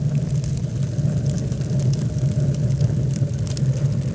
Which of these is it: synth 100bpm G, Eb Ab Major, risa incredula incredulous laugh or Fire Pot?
Fire Pot